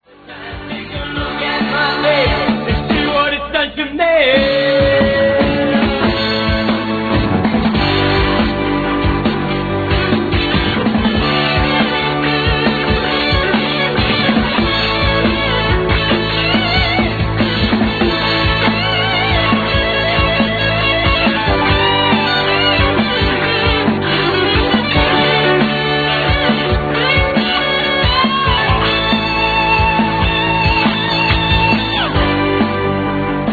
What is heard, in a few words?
guitar solo